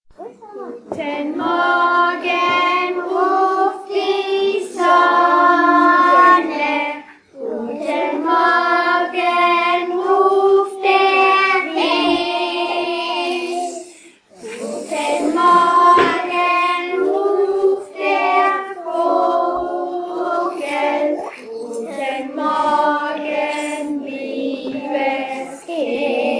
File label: kids singing guten morgen ruft die sonne